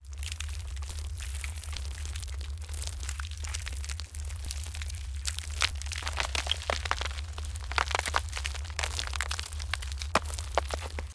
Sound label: building collapse